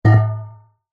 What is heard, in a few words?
industrial metal large container down on ground